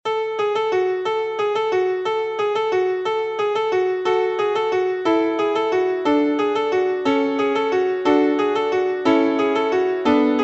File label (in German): Lied der Glocken